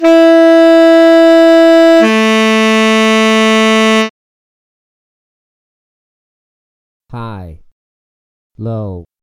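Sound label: high low Tenor Sax e3 a2; total beginner ear training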